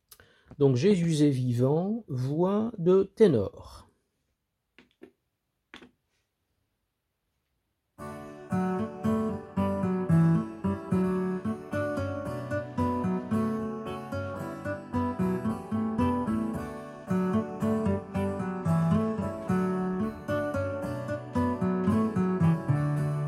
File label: tenor